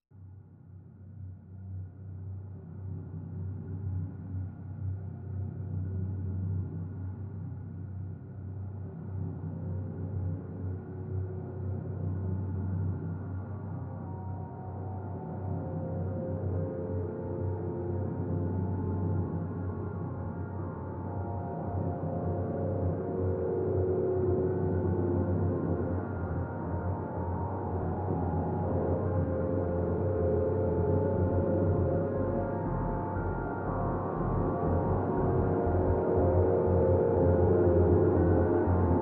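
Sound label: drone F; Drn Bleeding Out F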